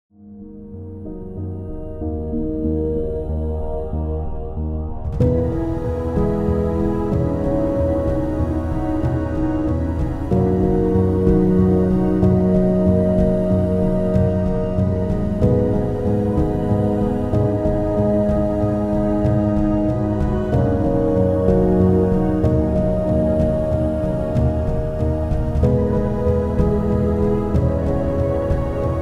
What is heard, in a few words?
cinematic, soundtrack; filmová hudba; originalni soundtrack k filmu